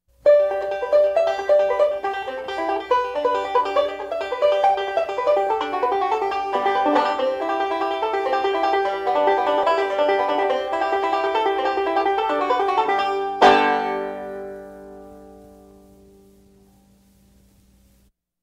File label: cindy 3 finger, banjo